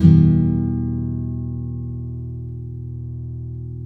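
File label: nylon up minor F, guitars, flam chords; Spectrasonics; Hans Zimmer Guitars VOL-2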